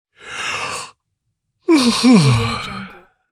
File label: male soft yawn; applehillstudios